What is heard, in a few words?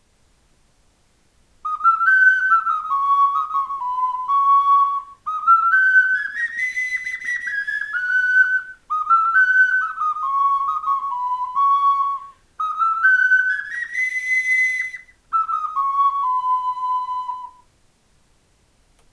ocarina